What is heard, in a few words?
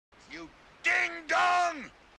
ding dong